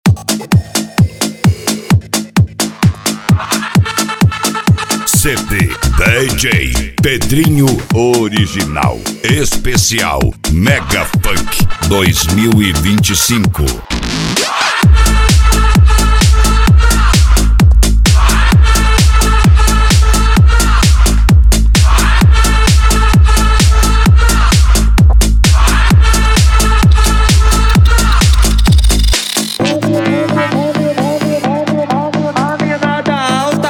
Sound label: mega funk